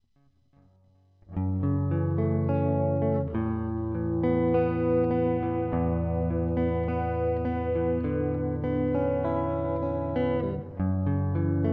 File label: Tone Check